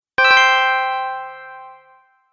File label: Notification, beep sound effects; object sound effects